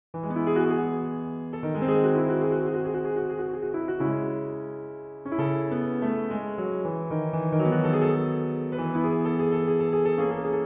French Suite No.6 in E Major, BWV 817 Pt. 2, Sarabande